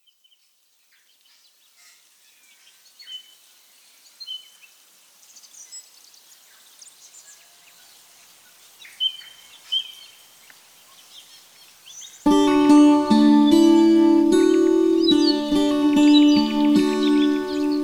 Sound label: ambiance bass guitar; smorgasbord